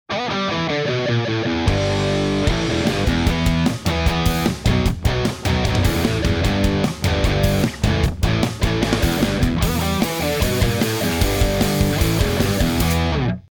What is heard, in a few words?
Fender Telecaster Custom Orange OR100 Orange PPC412 Celestion Vintage30 16Ohm